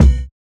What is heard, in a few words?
Kick, jungle breaks drum sounds